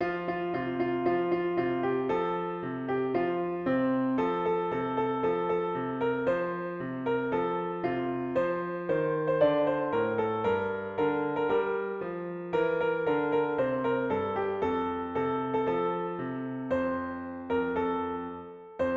クラリネットをこわしちゃった（ピアノ）

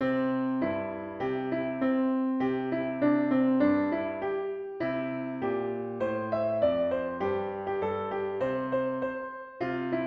証城寺の狸囃子（ピアノ）

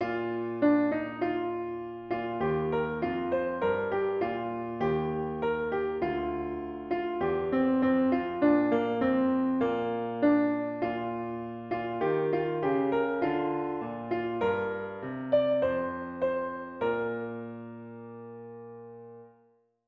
夏は来ぬ（ピアノ）

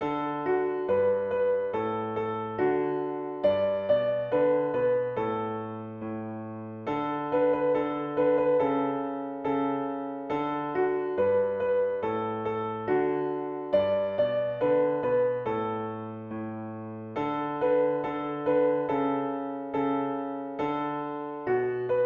虫のこえ（ピアノ）

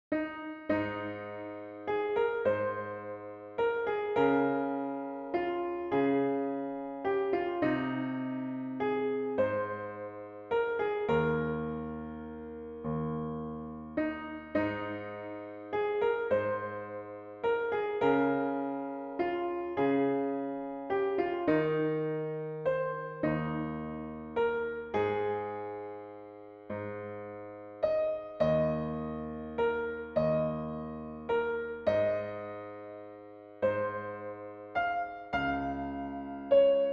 浜辺の歌（ピアノ）

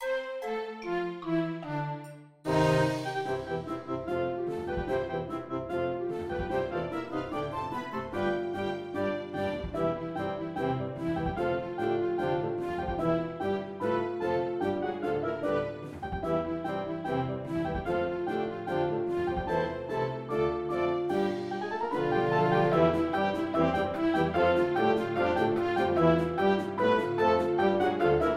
おもちゃの兵隊の行進（オーケストラ）

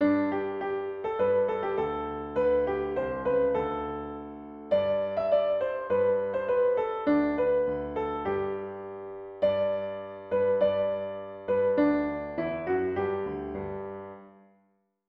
ピアノ

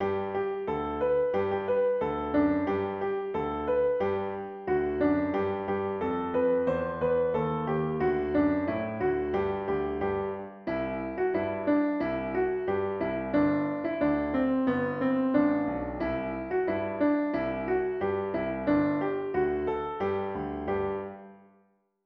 アルプス一万尺（ピアノ）

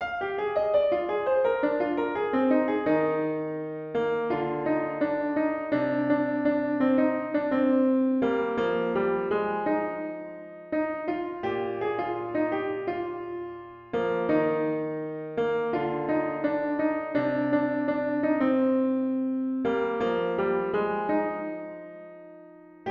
（ピアノ）

